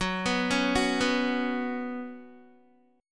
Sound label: F sharp Suspended 4th, Guitar; arpeggiated; F#sus4